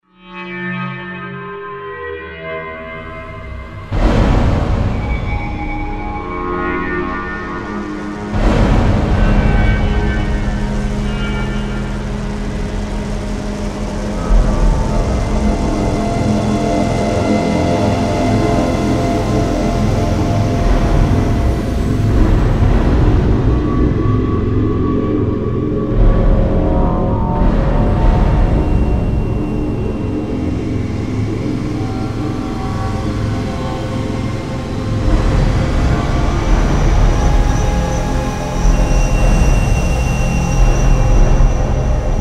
forest music; Richard Garriott's Tabula Rasa

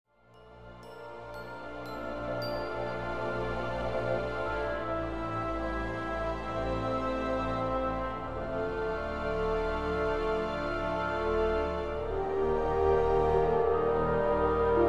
Ambient Pretty; rwby grimm eclipse

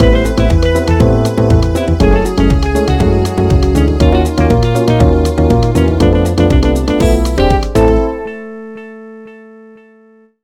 Level Start; Midnight Play Pack

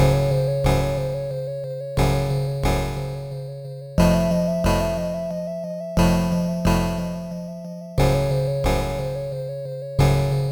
Music - BGM; fushigi no umi no nadia the secret of blue water; pc engine cd; turbografx 16

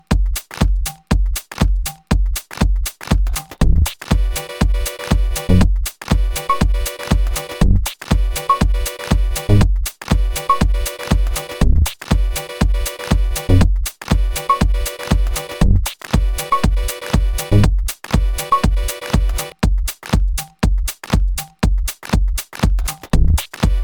Shuffling Loop

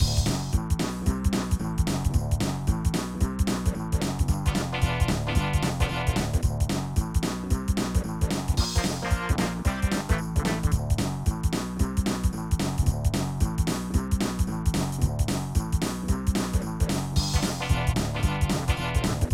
Bluegrass Boogie; cruisn usa

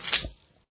Hot shot squirt; papas cupcakeria online windows gamerip 2013

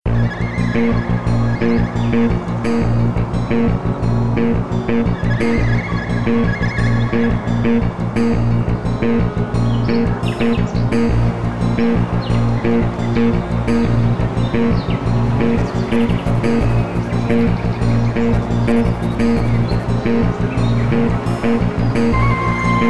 NO DRUMS BIRD; asterix obelix xxl 2 mission wifix 2006 playstation portable gamerip

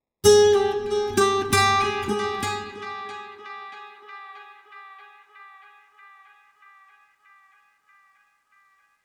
Oneshot Acoustic Guitar; Zombie Army 4: Dead War

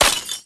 Ice Removed; Candy Crush Soda

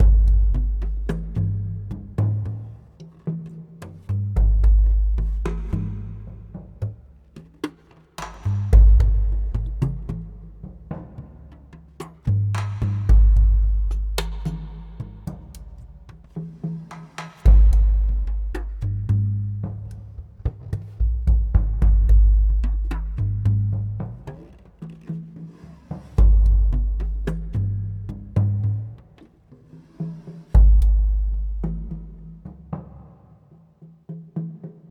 Just Drums; Unravel gamerip 2016

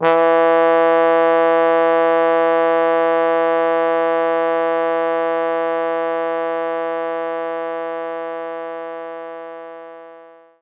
Trombone E2; knights try

